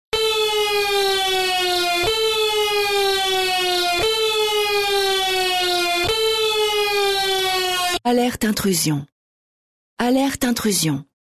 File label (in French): Alerte Attentat Intrusion avec signal